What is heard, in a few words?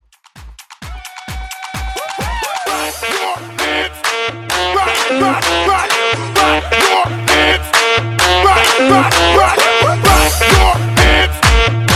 Mashup Hype Dirty 130 BPM